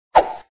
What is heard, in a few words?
Swing; Dad 'n Me